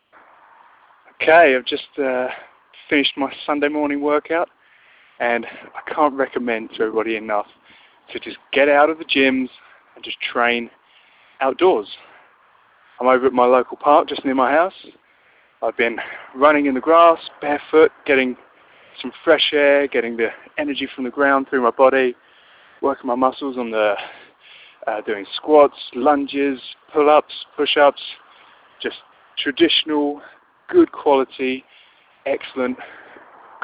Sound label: outdoor exercise